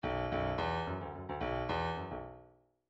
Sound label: bdf midi file grooves funk r funk r